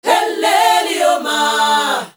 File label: ZULU CHR, FEM. CHOIR